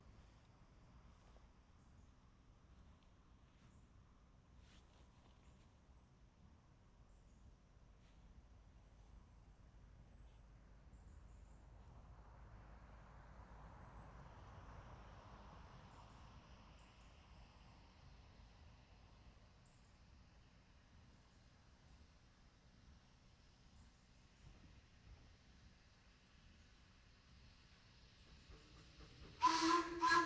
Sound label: Vehicles Steam Train Passing By Stereo Binaural